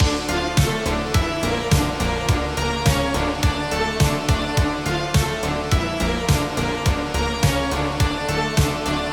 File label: Song Loop; Endocrisis